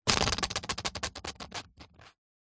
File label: leisure game spinner plastic on card push spin long